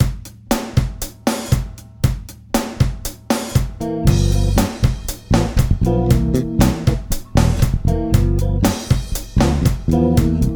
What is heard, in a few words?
FUNK RHYTHM SPREAD; Suhr